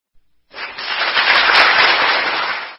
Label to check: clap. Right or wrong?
Right